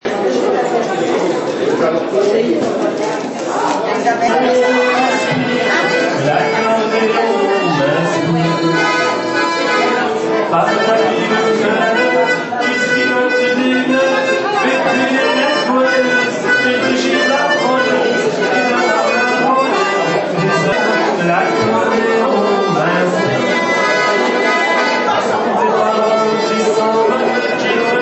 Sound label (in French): chanteur